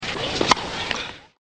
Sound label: tennis